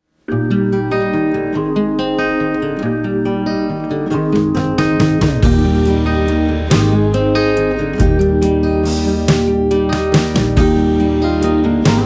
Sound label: Pangolin Waltz; Planet Zoo; You, Me & Other Animals